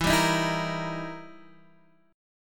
E Minor Major 7th Flat 5th Guitar Drop D; chord shape

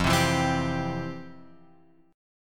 F Major 7th Suspended 2nd, guitar chord; FM7sus2 Chord {1 3 2 X 1 3} strummed

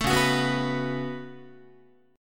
C sharp 7th Suspended 4th Sharp 5th, Guitar; C#7sus4#5; strummed; JGuitar

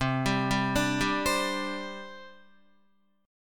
C Suspended 2nd, C, down, Guitar; arpeggiated

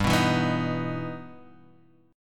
E Minor Major 7th G

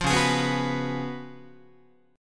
Db 11th, Guitar; strummed; JGuitar

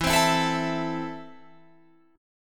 E Suspended 4th Sharp 5th, Guitar Open F; Esus4#5; strummed

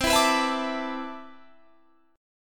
C Major 9th Add 13th, guitar chord

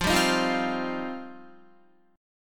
F 7th Suspended 2nd, Guitar; F7sus2; strummed

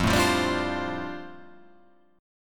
F 6th Add 9th F down, chord

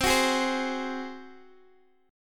F sharp Suspended 2nd Flat 5th C, Chord

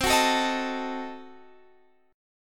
C Diminished 7th, Guitar Standard, chord; strummed; JGuitar

